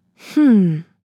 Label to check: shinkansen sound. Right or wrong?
Wrong